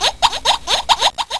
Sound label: laugh echo; Fantasm 5 Demo; Anvil